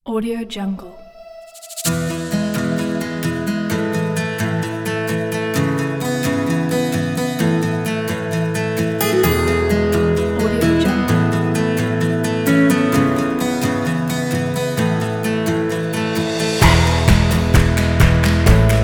uplifting folk

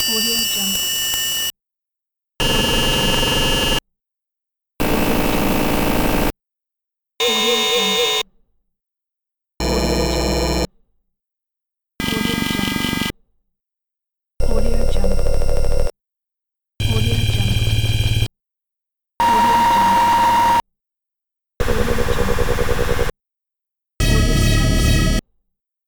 scifi machinery loop pack

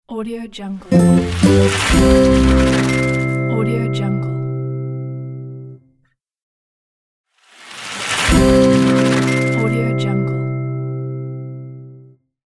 happy ocean logo

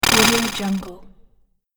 robotic circuit break

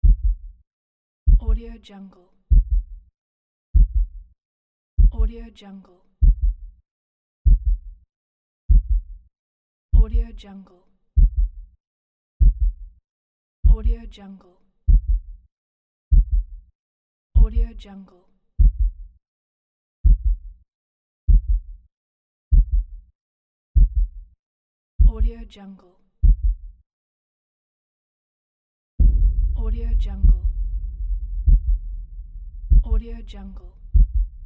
heart beat ambience

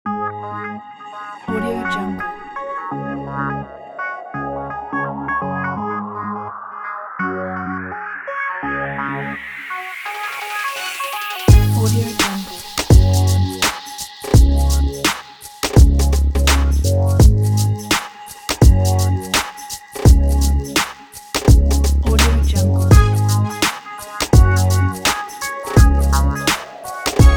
hip hop positive vlog

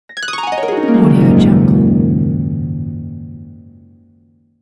harp glissando

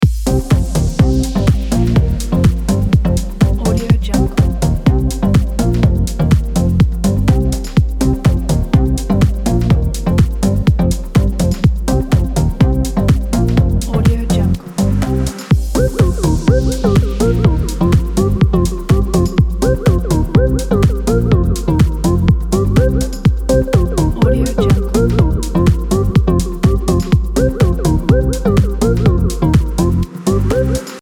fashion party loop; sweetmusic

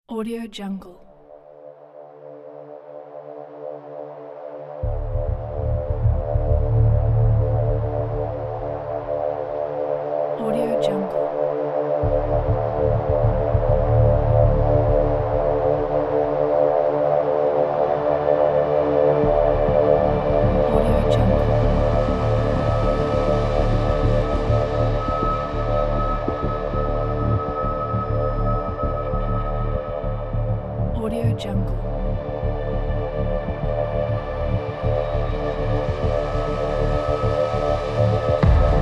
dark ambience

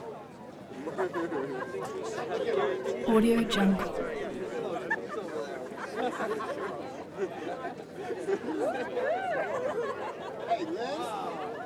crowd party atmosphere; prosoundfx

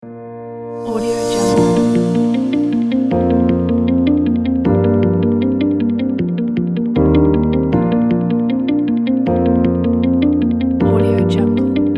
the corporate

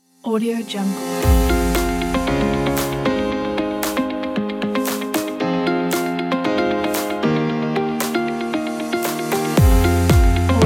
happy pop corporate

